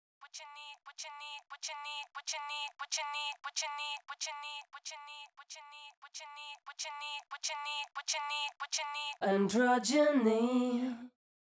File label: Vocal Combo; Multitrack